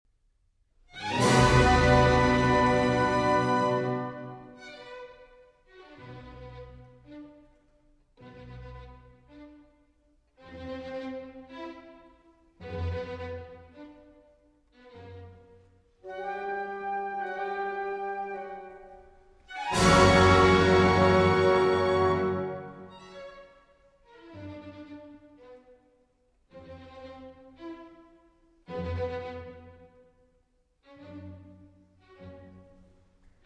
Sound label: Concerto No. 1 in D Major for Violin and Orchestra